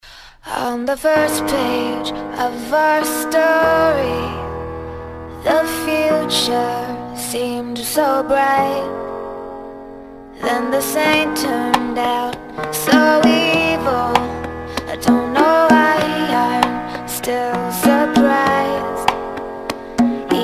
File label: Ballroom Rumba, 24-27 bpm